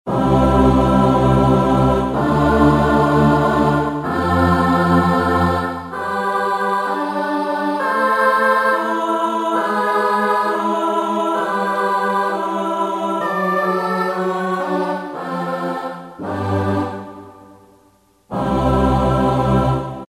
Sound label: Choir Aah, Casio CTK-601 Tones